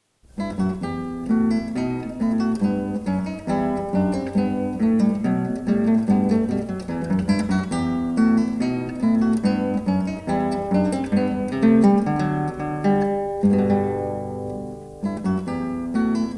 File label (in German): Lautensuite e-moll, Bourrée, guitar; BWV 996